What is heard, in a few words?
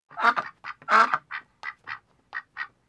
Duck